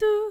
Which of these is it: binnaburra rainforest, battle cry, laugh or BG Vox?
BG Vox